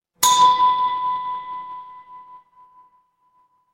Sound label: instrument bell